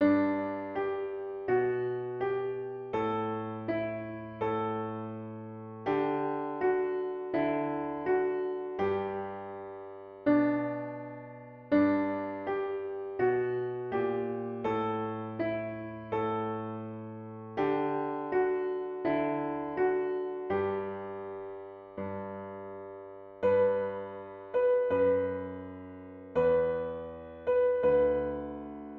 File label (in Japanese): ピアノ